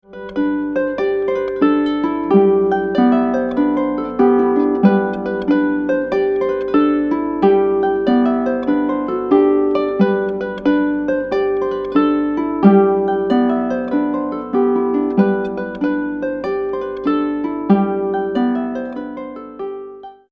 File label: clarsach